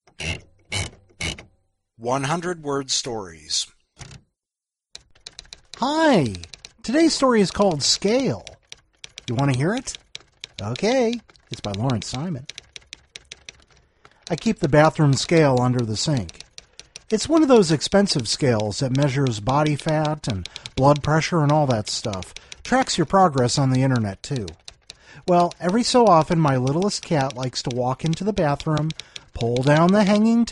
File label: scale